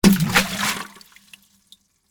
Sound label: Jug Shake Water, Open, Lid, Slosh; Foley; Shaking Sound Effects